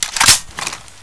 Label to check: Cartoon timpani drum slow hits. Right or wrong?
Wrong